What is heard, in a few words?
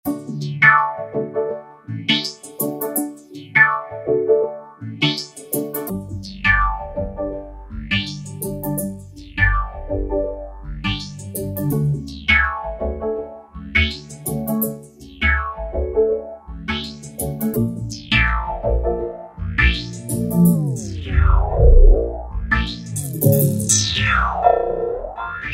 Roland V-Synth; Factory Patches